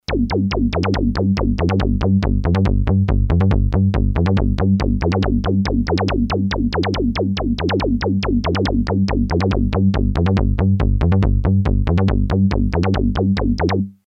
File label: reso lfo; nord3